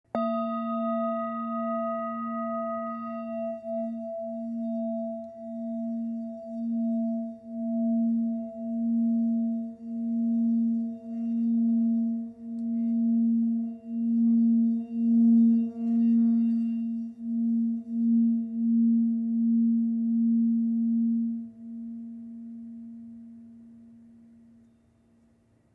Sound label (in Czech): tibetska misa